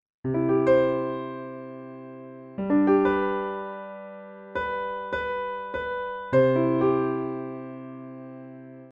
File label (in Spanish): sin voz